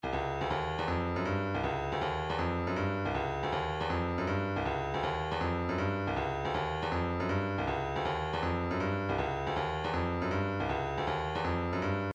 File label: bongo, midi